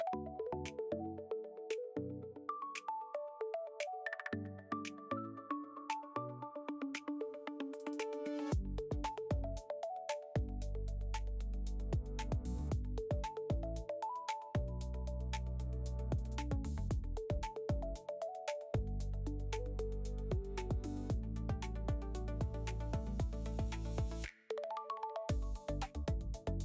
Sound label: music gameplay loop